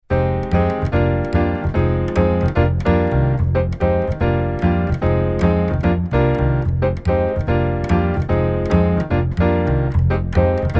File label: Jazz Waltz Bass